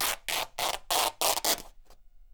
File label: Canvas Bag Rips